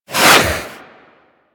Boomerang Whoosh

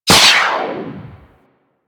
Corpus Modular Skate Dash; Warframe; Corpus Modular Crewman

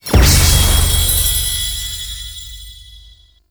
Nokko Area Defense Finish; Warframe; Nokko Colony

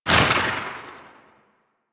Rifle Tail; Warframe; Infested; Quanta

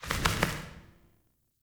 Sparrow Death State Three Wing Flaps; Animals

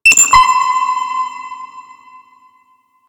Seeker Drone Beacon Loop; Warframe; Eidolon; TuskSeekerDrone

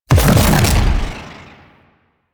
Proj Perfect Pulse Impact; Warframe; The New War; Quests; Weapons; Omicrus

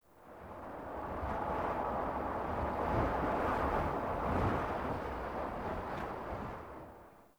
Fort Wind Gust Low Mid Howl; Ambience

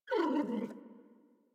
Puppy Idle Coo; Warframe; Kubrow

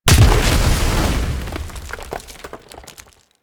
Inferno Comet Hit; Warframe; Ember

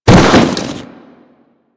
Fall; Warframe; Tenno; Foley

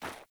Sandals Run Snow, Footsteps; Characters; Player

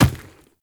Infested Medium Run Dirt, Footsteps

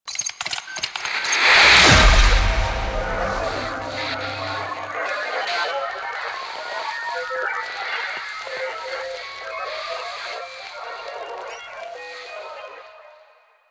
Title Card End; Warframe; Nineteen Ninety Nine; Quests

